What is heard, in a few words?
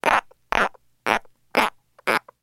Frog Australian Tree